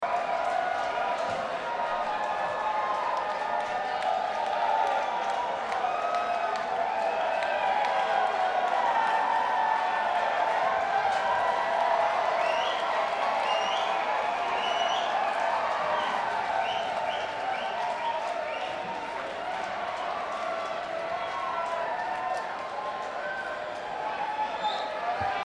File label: Crowd